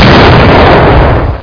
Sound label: wv explos